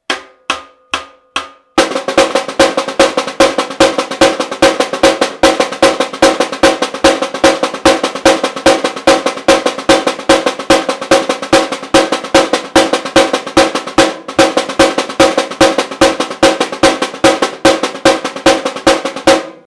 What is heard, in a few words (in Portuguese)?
batucada caixas caixa 144 bpm